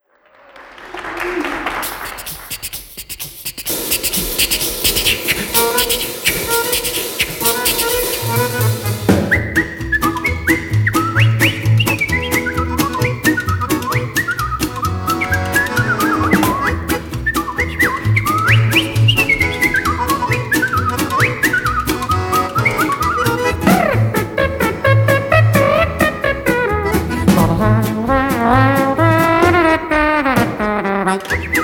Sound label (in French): Ragtime de l'homme orchestre